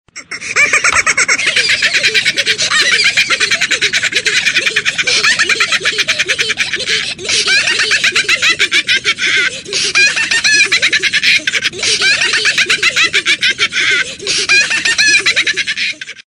risadinha muito alta toque

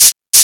spray efeito sonoro

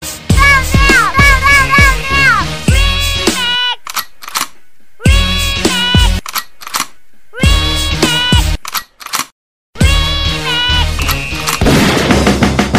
bebe vs tiros, toque bebe vs tiros de arma de fogo

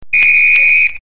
apito de futebol, som de apito de juiz

